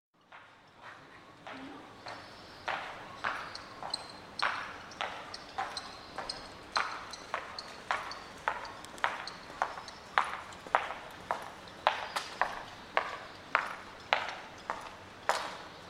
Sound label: Footsteps By In Echoey Cobbled; Hollywood Edge Historical Series; Traffic Free BG's & FX